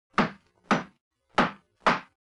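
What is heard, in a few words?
Hammering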